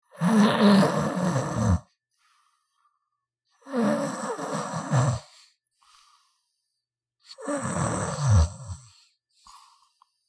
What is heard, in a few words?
Man snoring violently heavy snorts